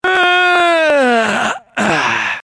Yawn; Persona 5; Joker

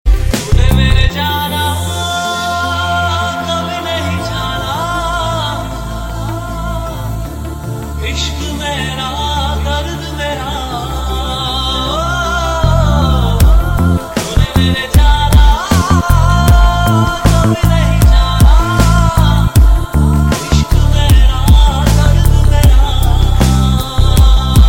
Tune Remix

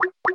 knocking; Skype

